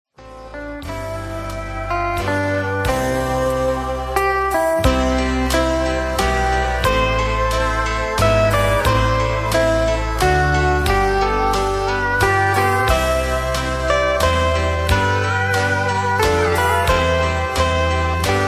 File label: music with LEAD in HIGHER KEY